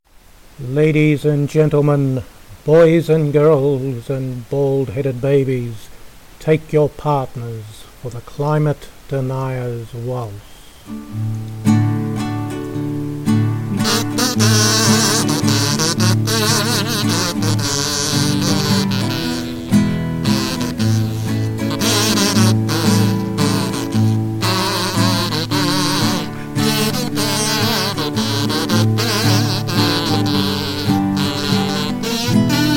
soprano tenor with voice

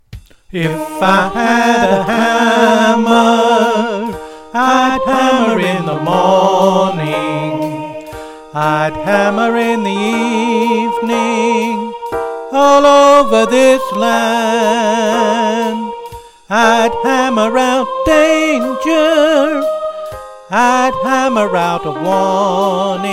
swing melody soprano vocals

